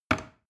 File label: plastic button small push heavy